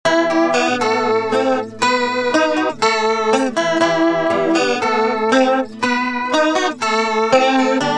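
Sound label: acoustic guitar clone theory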